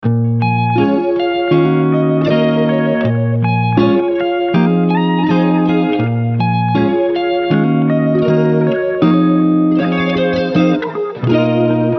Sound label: 160 BPM Bbm; Summertime